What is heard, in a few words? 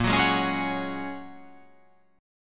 A sharp Minor 7th Sharp 5th A sharp